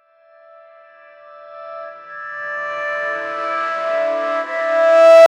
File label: reversed sitar tension ri